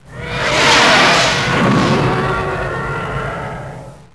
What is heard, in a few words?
tie pass; tie fighter